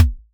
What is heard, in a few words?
archery thump